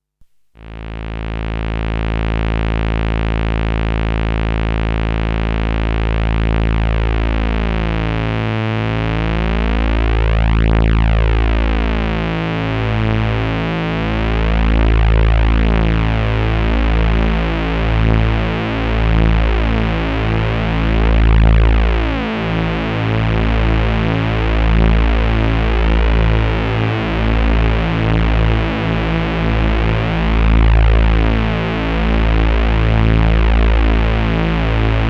Drone 1 4 VCOs SAW

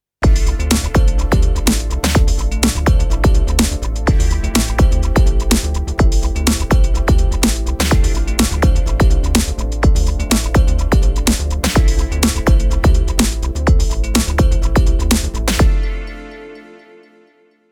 Sh-4d Saw Noise

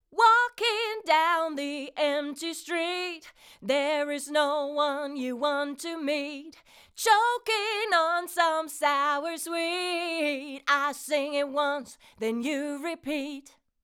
Rupert Neve Designs 5254 Vocal Auto

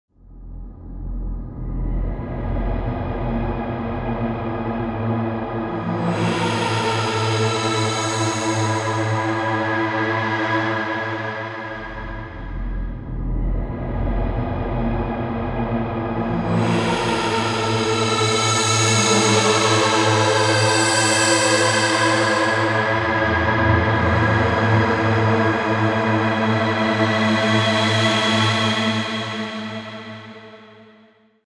Meta Tubes

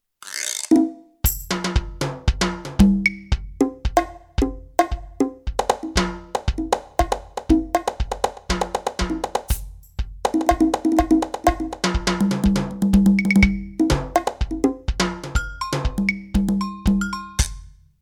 Thomann MPS 850 Kit Perc Kit

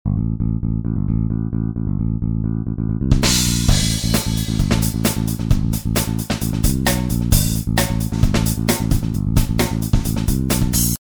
Drum + Bass

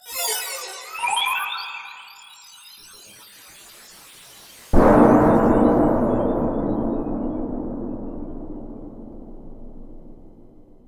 SFX Iron Door

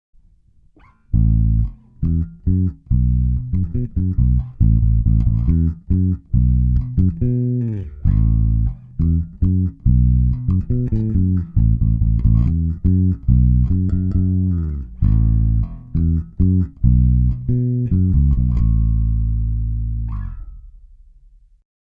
Marleaux Deep Reggae

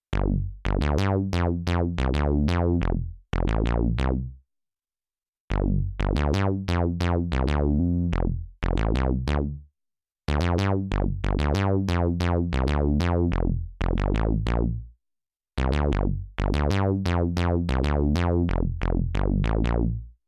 Bassline SEM

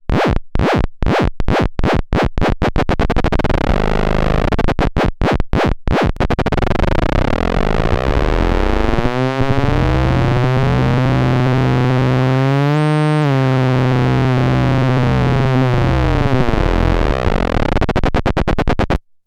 LFO

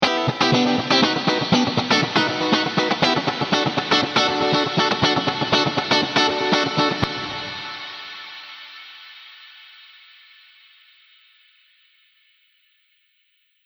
Funky Git Chamber

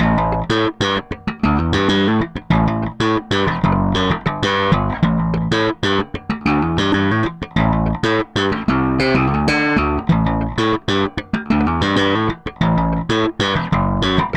slap

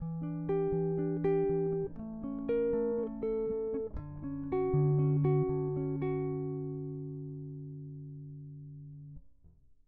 Ibanez EHB1006MS Chords Neck passive

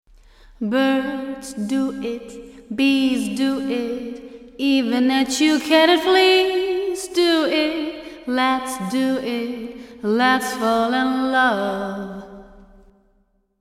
Bright Hall

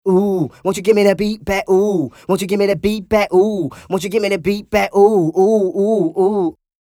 Chandler TG 1 Vocals dry

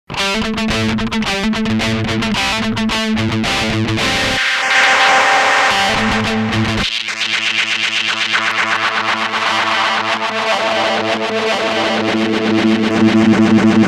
Kaoss

